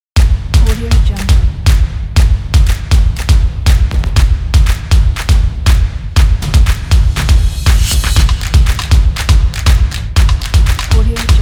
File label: drums kit